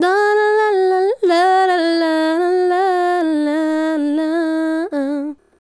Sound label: Female Solo, Hip-Hop and RnB Vocals